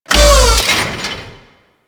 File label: Shipyards Robot Arm Large Lower; Ambience; Props; Warframe; Grineer Shipyards